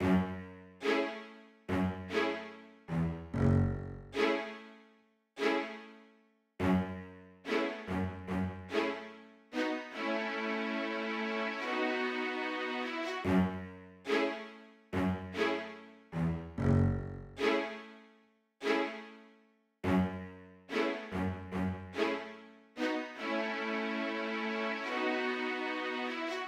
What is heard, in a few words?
strings, Smooth RnB, F#min